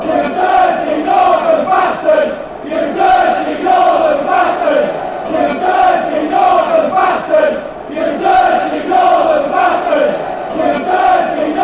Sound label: you dirty northern bstards, fanchants; ipswich